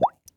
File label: bubble